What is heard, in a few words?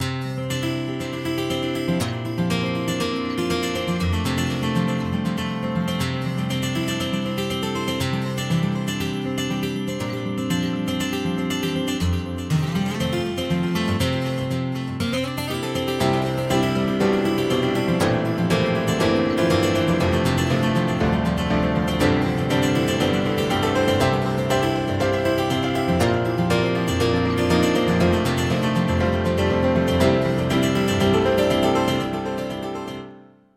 Delay